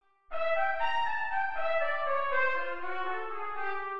Dominante inv0 en C

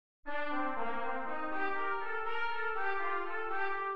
Min7 en C

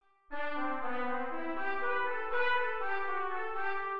Maj7 inv0 en C; La Provincia

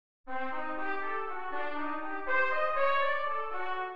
Min7 inv0 en C

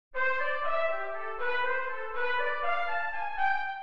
Maj7 inv0 en C

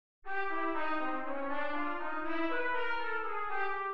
Maj7 en C; Las Manuelitas

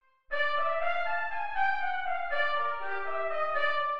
Dominante inv0 en C

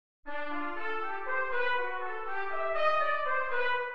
Maj7 inv0 en C; Aguas Blancas

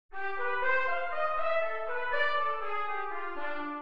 Maj7 en C

